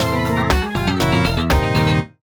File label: Lose